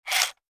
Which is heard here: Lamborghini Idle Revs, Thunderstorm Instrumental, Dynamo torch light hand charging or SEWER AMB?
Dynamo torch light hand charging